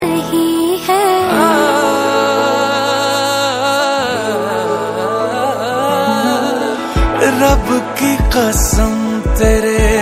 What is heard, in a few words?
female version